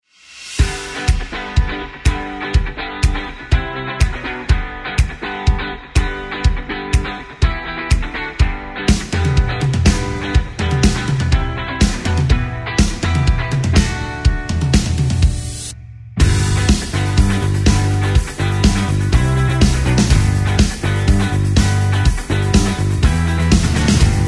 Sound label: rock pop